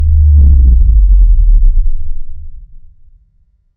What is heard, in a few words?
Low Rumble Slo Mo Impact; slow motion transition